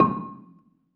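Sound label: Balafon C5, Asian Traditions; Soundscan vol.60; AKAI; BELAFON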